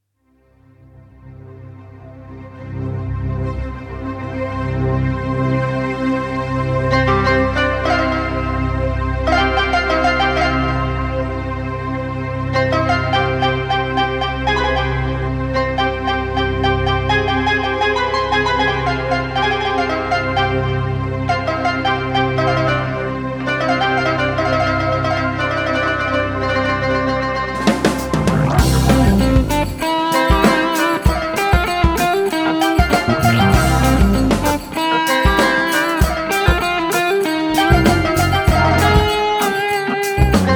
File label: Blues in Rast; maghrebjazz